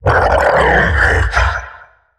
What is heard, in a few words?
onslaught channel; weapons; dota; primal beast